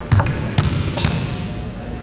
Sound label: basketball hitting rim and bouncing; sports